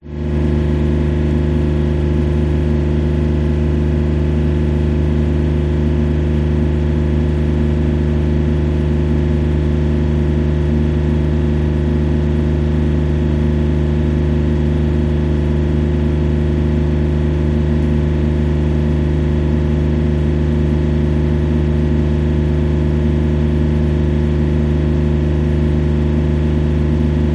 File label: power generator hotel vietnam